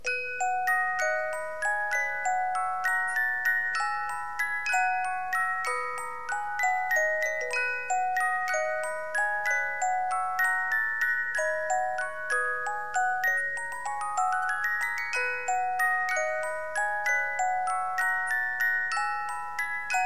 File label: music box waltz